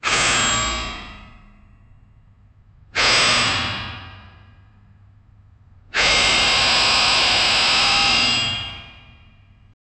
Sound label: metal robot jumps on a